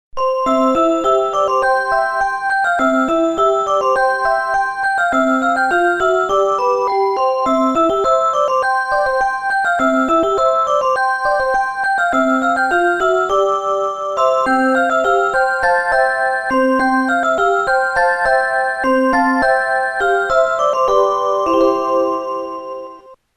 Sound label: Four Seasons Spring